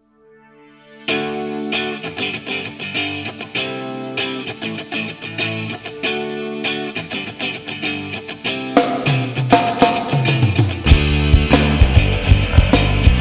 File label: Pleasant Groove; Soundzabound Volume 4